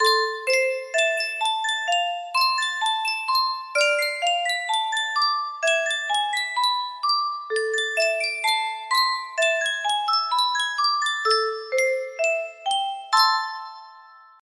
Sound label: Yunsheng custom tune music box